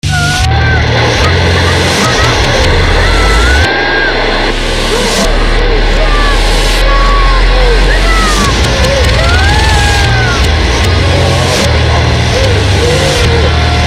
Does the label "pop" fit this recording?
No